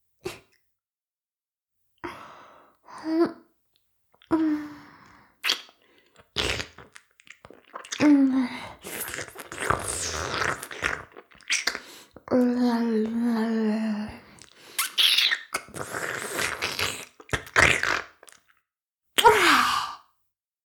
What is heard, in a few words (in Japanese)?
耳舐め音声, ASMR; 援交JK魔法少女マスカリチェリー